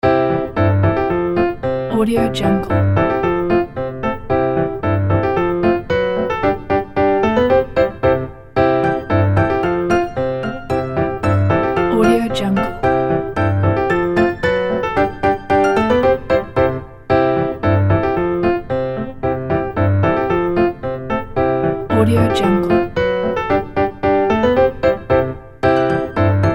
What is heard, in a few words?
little piano loop